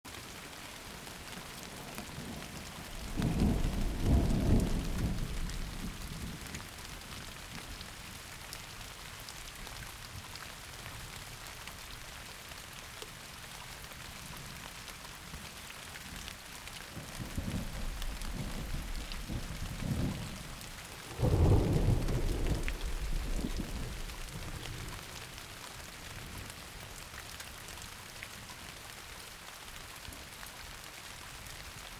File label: thunderstorm